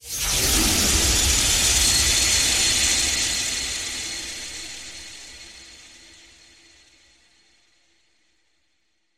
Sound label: magical fire with sheen; soundscrate